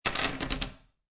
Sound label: Poker Chips Drop Stack Of Chips On Wood Table; Lynne Music